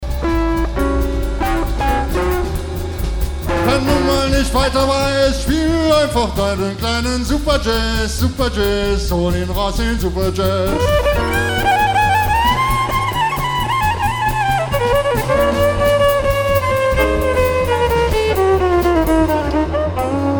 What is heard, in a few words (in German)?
kleiner superjazz